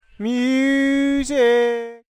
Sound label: sing